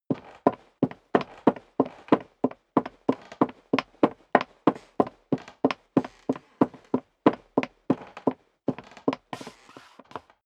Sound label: step leather shoes wood creak run sequence; schritte